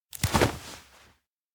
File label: uniform hook unfasten; anemoi